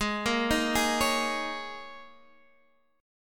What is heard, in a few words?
Ab Suspended 2nd Suspended 4th; x,11,8,8,9,9; arpeggiated; JGuitar